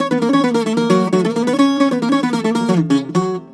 BOUZ F#

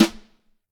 Vintage Snare Drum; Q Up Arts; Johnny.C's Vintage Snare Drum

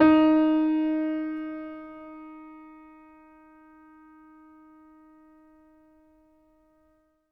MIX R, Acoustic Piano, PIANO STEREO; InVision Interactive; Miroslav Vitous

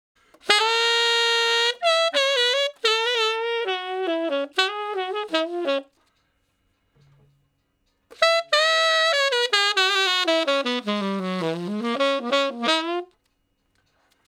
Sax Slo Blus (Db IV, IV, I, I, V); Ten Sax Slo Blus (Db); Solos; Crimson Blue and Fabulous Horncraft 4 RnB; Horncraft for R&B

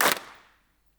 Group Clap, Handclaps

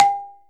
Balafon G#4; Multisamples; Digital Sound Factory Planet Earth

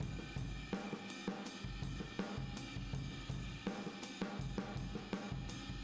Boogaloo Chorus Room M, 82 BPM

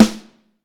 Snare Drum; Q Up Arts; Johnny.C's Vintage Snare Drum; 7.5X14

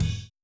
Boogaloo Chorus Tom Floor, 82 BPM; Drums from the Big Room The Mixes

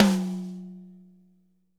tom, drum

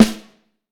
Vintage Snare Drum; Q Up Arts; Johnny.C's Vintage Snare Drum; 5.5X14

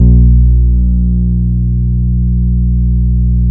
ESQ 1 MOOG B2, SYN BASS; SYNBASS1 QB1; Soli-Music; World of Synthesizers

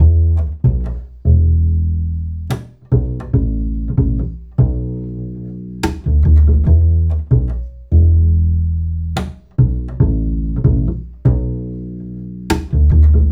Acoustic Bass

